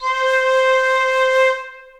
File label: Piccolo Section Legato Vibrato; Ableton; Orchestral Woodwinds